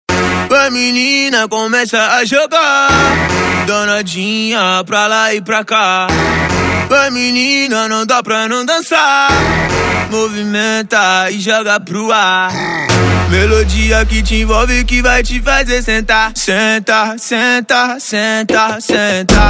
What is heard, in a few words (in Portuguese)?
baile funk